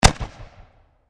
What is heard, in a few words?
Explosion Firework Mortar Launch; Firework Sound Effects; Fireworks (Sound Effects:Backgrounds); SFX Bible 6